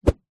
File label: stick snooker cue swing air whoosh; foley; zapsplat